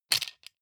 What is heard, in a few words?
plastic frame small hit rattle